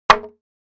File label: 2x4 Impact, hits